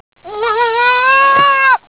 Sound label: Nubian Baby, goats